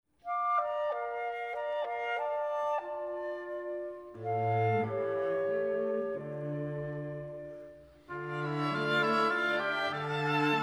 instrumental

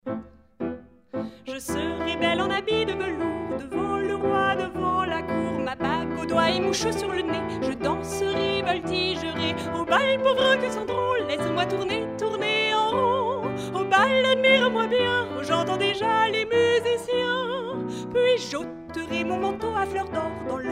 piano chant; 5e symph 1 mvt

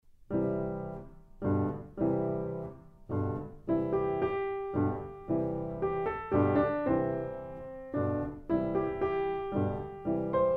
piano seul

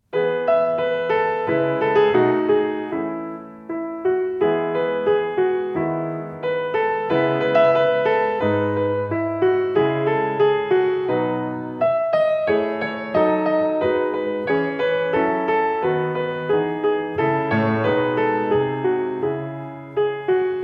la ronde des oiseaux piano

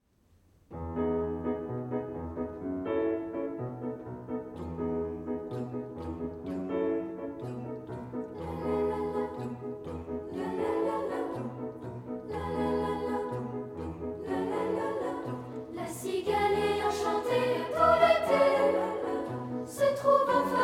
voix piano

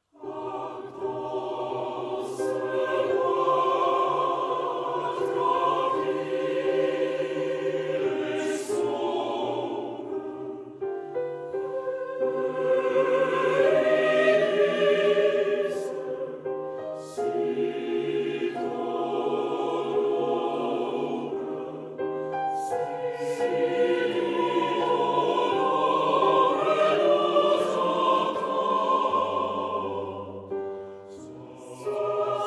tutti; gluck; ah dans ce bois tranquille

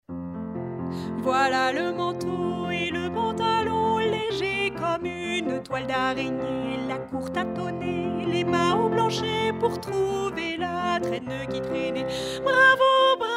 piano chant

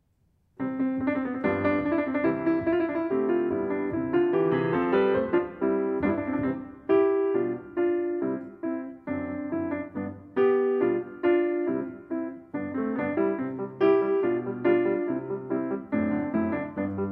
piano seul; mozart symph concertante 3e mvt

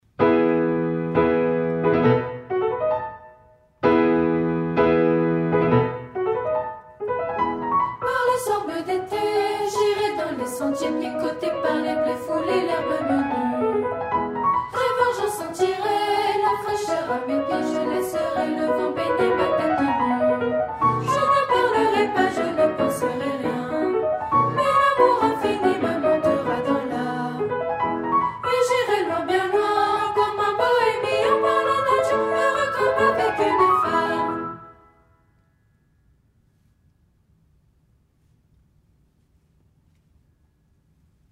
piano chant

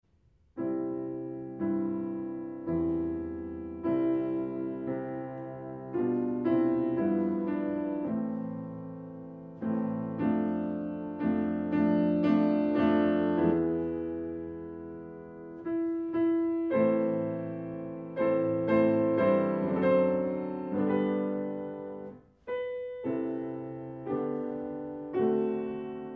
beethoven 2e mvt piano seul

